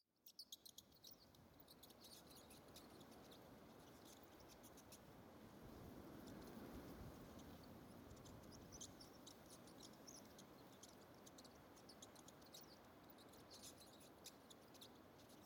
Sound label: Harbor Village Bridge